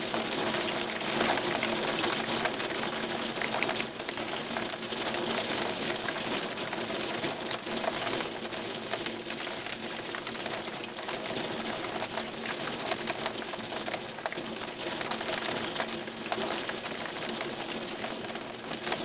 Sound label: hail interior glass loop; Sonniss GDC